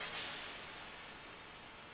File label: TADA